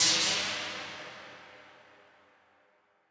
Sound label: Noise Verb Decays; stereo toolkit samples